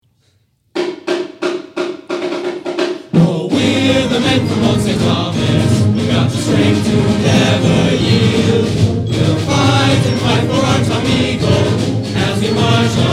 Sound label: Fight Song with Choir; Fighting Eagle